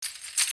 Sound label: Tribal fold close; Nokia; Audio themes